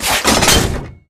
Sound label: taunt tank appear; video games; source engine; tf